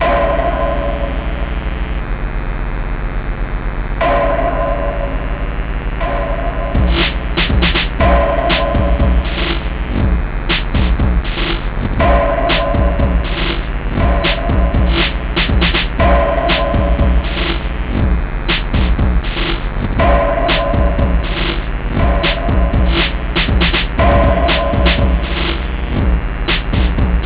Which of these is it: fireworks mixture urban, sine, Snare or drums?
sine